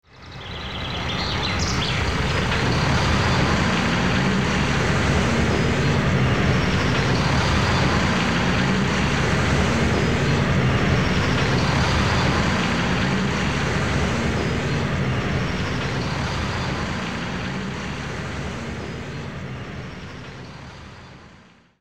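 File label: ambience hitchhike; Wario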